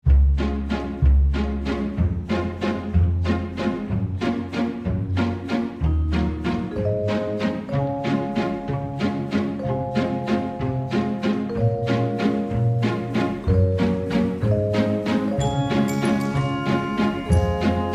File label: base game music; Fondant Favourites StarTrail; Eyecon